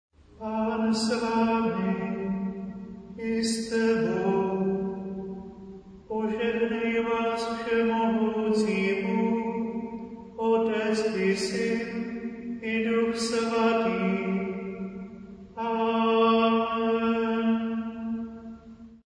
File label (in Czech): požehnání chorál; zpivany mesni rad